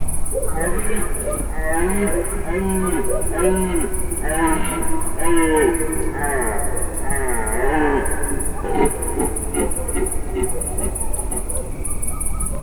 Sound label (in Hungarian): teljes, gímszarvas; termeszetben